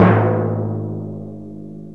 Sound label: TIMPANI